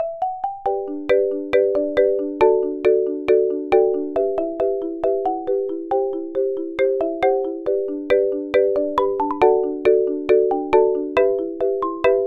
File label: marimba, ringtones; frozen; Disney